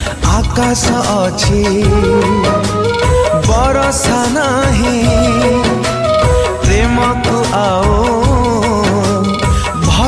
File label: Odia Broken Heart Ringtone